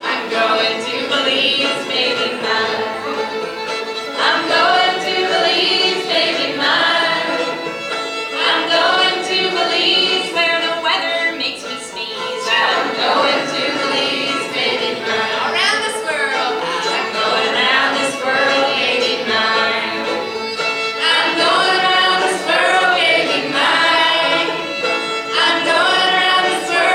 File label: banjo pickin